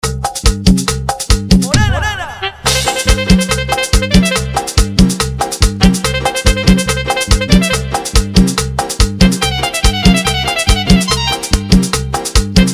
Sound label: Extended 142 BPM